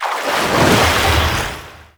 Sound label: Crushing Water Positional Cast; Warframe; Yareli